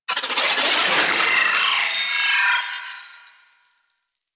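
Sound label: Proto Infested Mutalist Projectile By; Warframe; Abilities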